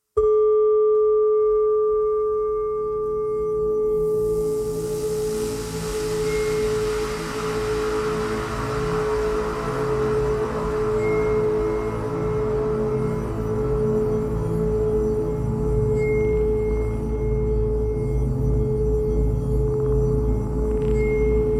Spiritual Waves

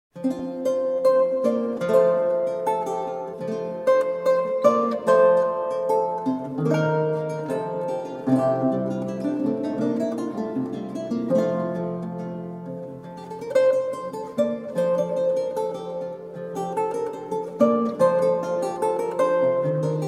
Passion, Lute music; Lady Hudson's Puffe; John Dowland; Lute songs

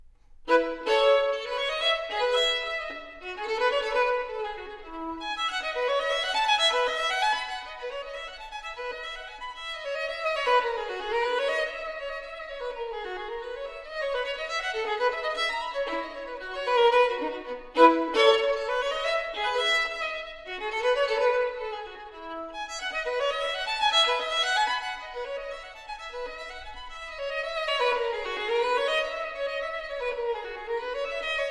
Partita No 3 in E Major - V Bourree, Solo Violin; BWV 1006; Johann Sebastian Bach; The Six Sonatas and Partitas for Solo Violin

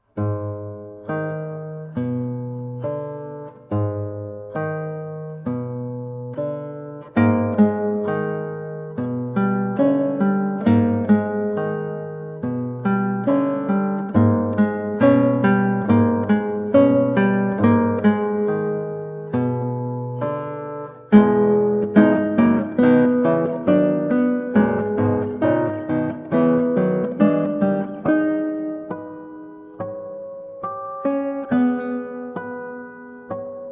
Prelude in G sharp Minor; Preludes and Fugues

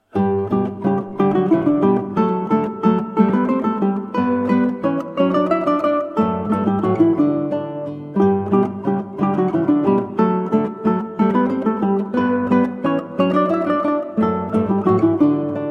Menuetto, Ukulele and Guitar; from Baryton Trio 36 Hob XI 36; Franz Joseph Haydn

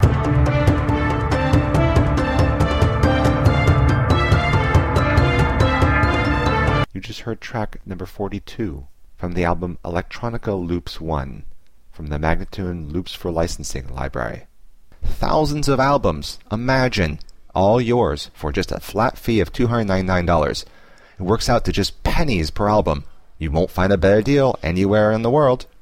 140 D spoken; Electronica Loops 1

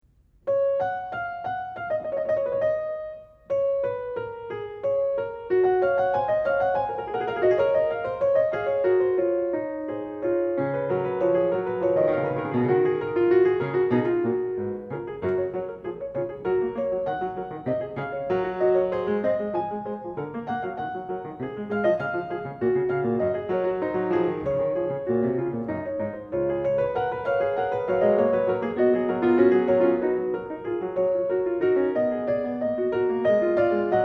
Prelude and Fugue No 13 in F-sharp major, Clavier; Johann Sebastian Bach; Well-Tempered Clavier

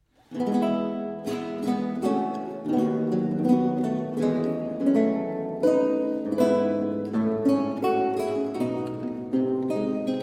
Duet III Sarabande in F Major, lute; Anton Logy

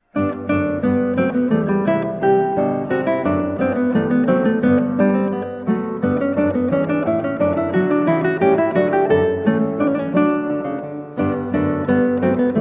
Bouree from Flute Sonata in G Major, Guitar; HWV 363b; Handel; George Frideric Handel

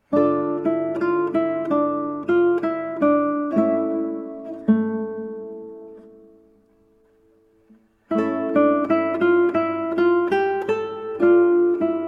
Largo Concerto in A minor, 8-string guitar; RV356; Antonio Vivaldi

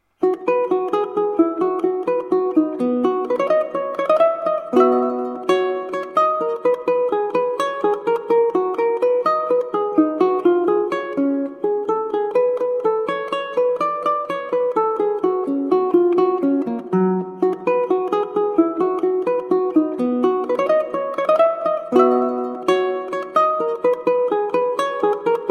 Gigue from Cello Suite 1, Classical Ukulele; BWV1007; Johann Sebastian Bach

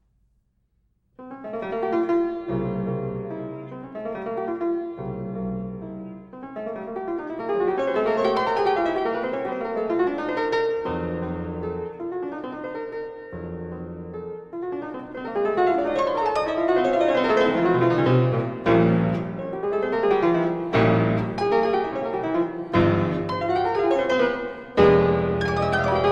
Preludes Op 28 - No 18 in f minor spoken, Piano; Frederic Chopin

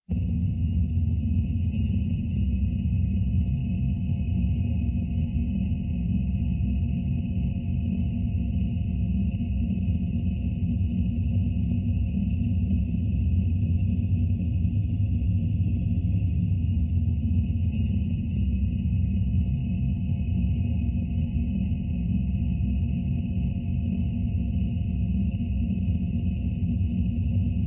Red Distortion, Noise; Noise HiFi

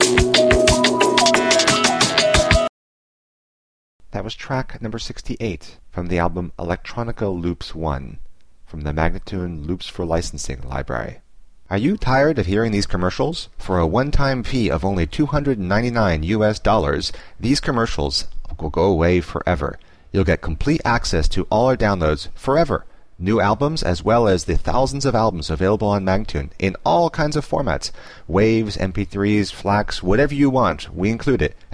090 E spoken; Electronica Loops 1

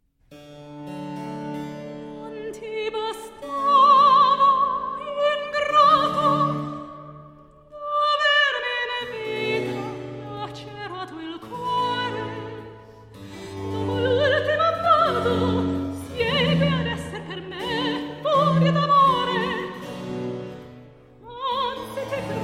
Cantata Recitativo; Il Delirio Amoroso; Handel

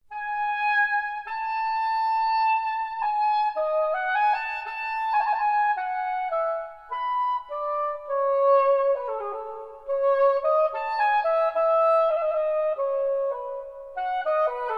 Suite in F major for unaccompanied oboe; after BWV 1007 (sarabande); Johann Sebstian Bach; JS Bach - Transcriptions for Oboe

